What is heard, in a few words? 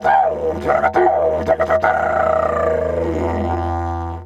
F2 WILD, Didgeridoo; Big Fish Audio - Didgeridoo and Other Primitive Instruments